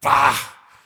vce counter

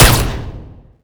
protogun 1shot indoor

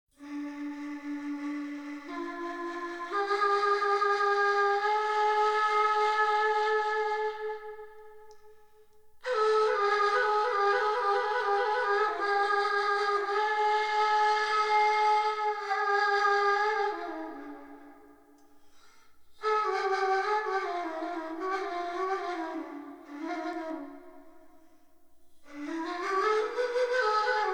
Nay Solo; Music; Middle East Africa; Civ5

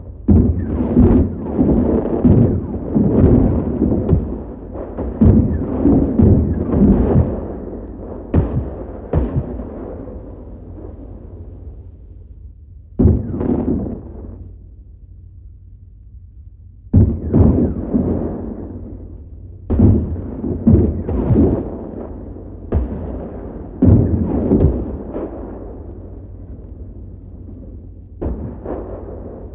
Amb Destruction; Red Orchestra 41-45

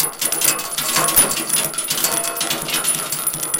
shells loop

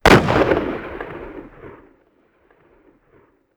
park occasional

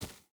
footstep barefoot dirt run; Rust

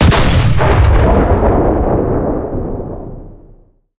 cannon 75mm kwk40 shot, cannons tanks

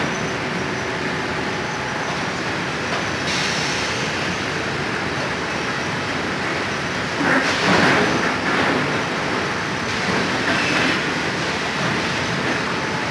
industry, ambient; World of Tanks Blitz; pliego

